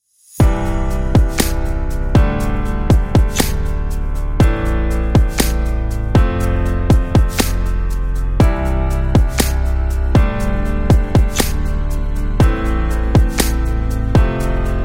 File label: Calm RnB Beat; ambient beat